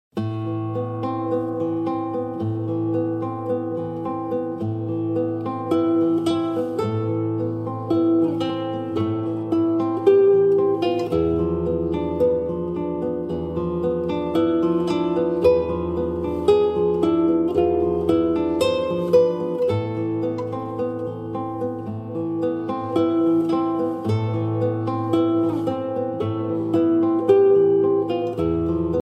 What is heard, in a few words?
latin american dance ringtone